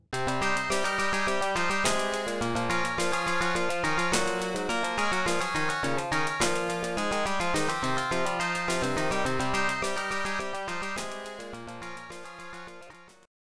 unused music; Tetris sega

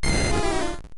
cry; Missingno 0x89

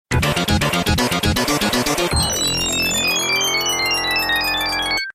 game over; Sonic Spinball

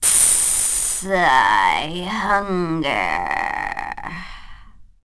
harpy amb; Daikatana

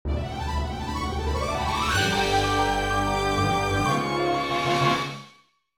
WIN

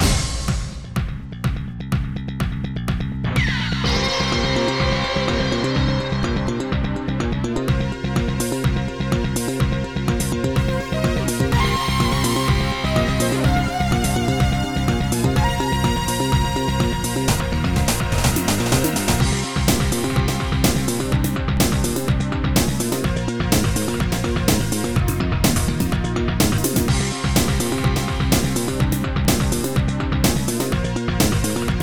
Bonus; Jazz j2

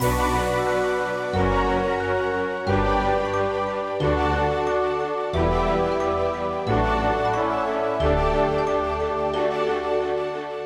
Menu Pattern

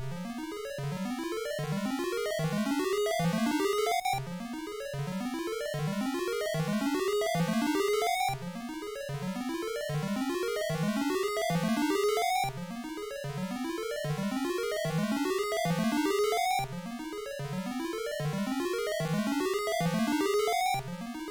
Unused Photo Deletion Song; GBC

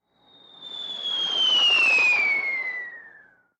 Bomb whistle; Source Filmmaker

